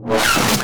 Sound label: Machine Growl